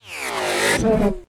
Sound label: bot sit; crazyeights